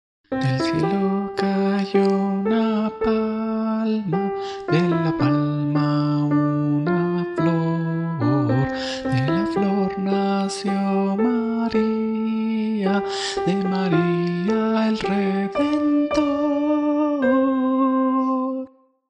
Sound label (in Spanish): voz